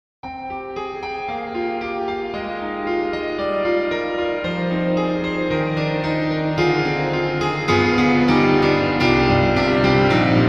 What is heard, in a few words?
CONCERT GRAND; GARRITAN; CFX CONCERT GRAND; Big head space